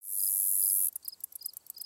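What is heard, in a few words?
Pets Insect Large Idle; creatures pets; Lego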